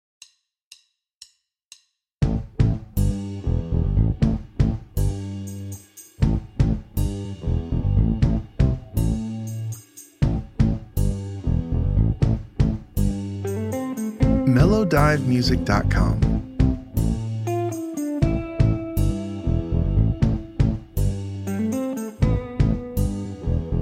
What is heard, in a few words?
modern funky tone